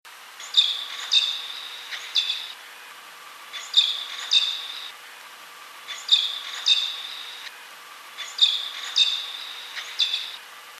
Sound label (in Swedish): Pilgrimsfalk